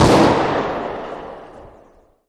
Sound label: g3sg1 distant; arccw go